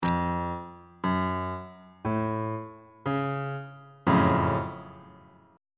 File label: g flat minor major 7th 3rd inversion